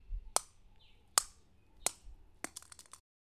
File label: Cristmas Ornament Bouncing